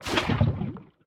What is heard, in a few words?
fluid unsoak viscous; TFC Quick Pockets